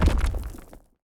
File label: Crossbow Earth Choice UI; TANK; 3Souls